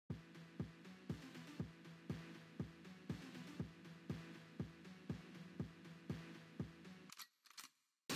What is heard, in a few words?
Phonk